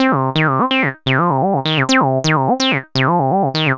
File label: 127 Cm Aciiid Synth